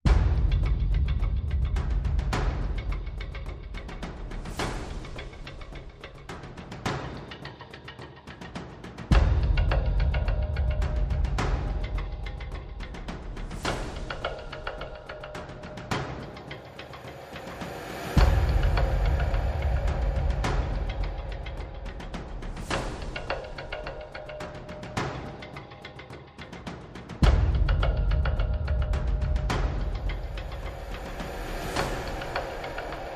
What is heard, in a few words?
Perc and FX Only